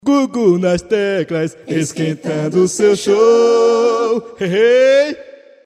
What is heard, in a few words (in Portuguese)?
vinheta